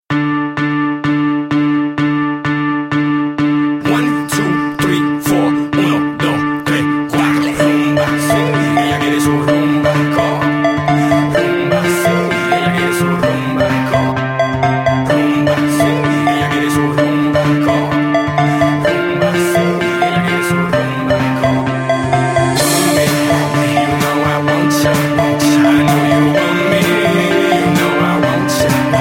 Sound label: electro mix, ringtones